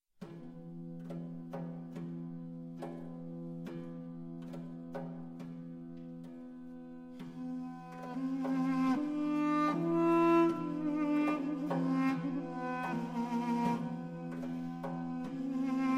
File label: Duduki Instrumental